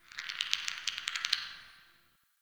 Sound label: Sentient Lair Magnet Chatter; Warframe